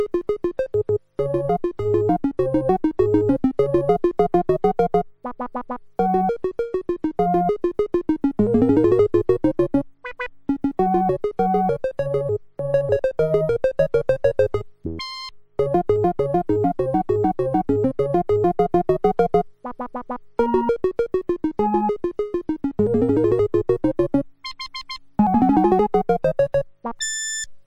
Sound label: Theme Tune In UHJ Ambisonic Surround Sound; Automatic Ambisonic Surround Sound Panning